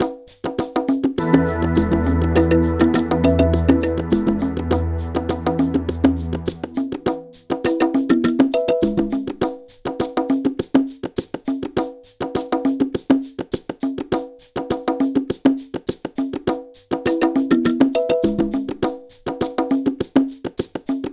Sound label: music beach, bpm102; Donkey Kong Country Returns HD